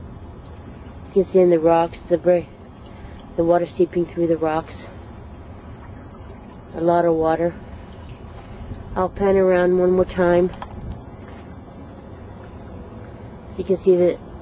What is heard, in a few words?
jetty